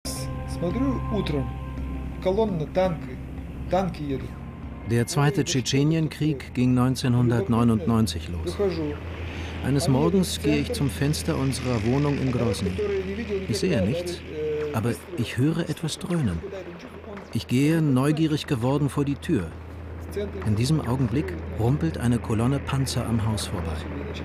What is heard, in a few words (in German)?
das tschetschenische Akkordeon